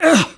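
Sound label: Soldier Damage; Kara; Gamigo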